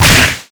Hit Heavy Forest; netent

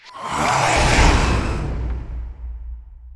character Big Win End hell; netent

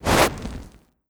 Hit Light Volcano Add; netent

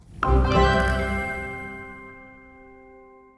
large win; netent

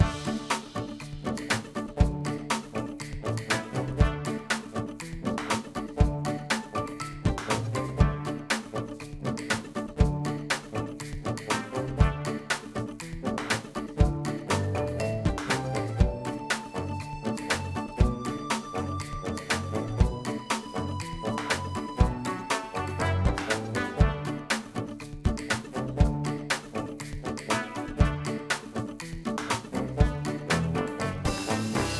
general Ambience Music; netent

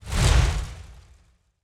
random Wild Horse Shoe Fly In; netent

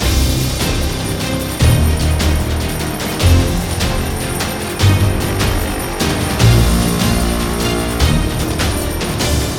big win loop; netent

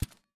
sparks reel stop; netent

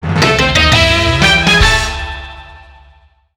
Win Large; netent

